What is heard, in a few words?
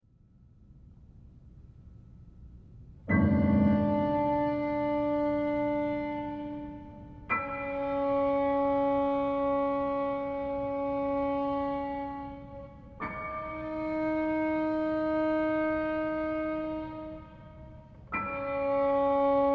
untitled work for violin and piano